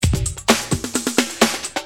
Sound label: Drums, Perc, Industrial